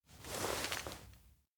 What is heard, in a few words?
headshake heavy; minato; anemoi パッケージ版 1.1